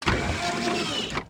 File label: scuff